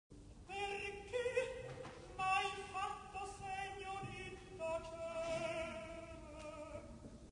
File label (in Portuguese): contralto